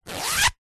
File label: clothing jacket cloth zipper; zapsplat